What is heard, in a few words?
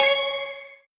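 Countdown Alert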